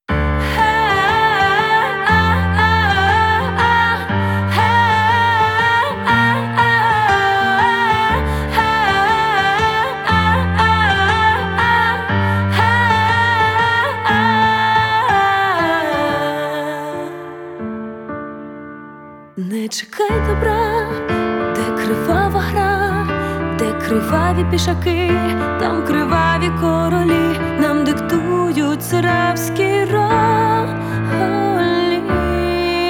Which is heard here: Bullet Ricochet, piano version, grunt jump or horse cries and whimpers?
piano version